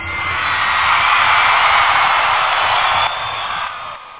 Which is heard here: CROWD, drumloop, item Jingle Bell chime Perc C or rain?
CROWD